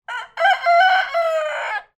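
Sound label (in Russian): петух утвердительное пение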